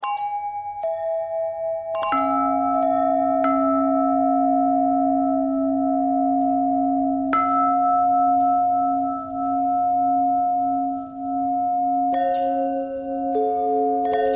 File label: bell long bell thread pentatonic